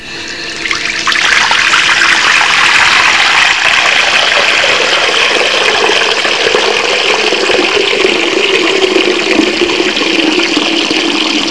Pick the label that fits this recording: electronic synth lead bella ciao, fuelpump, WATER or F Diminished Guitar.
WATER